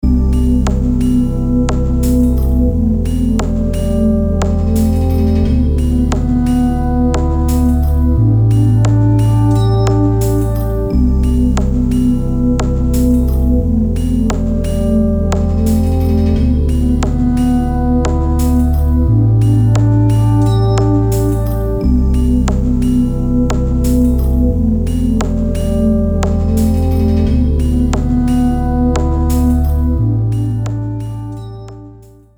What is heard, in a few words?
GM loops